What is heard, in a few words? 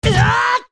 Fall Down; Fierce Deity Link